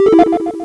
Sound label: MESSAGE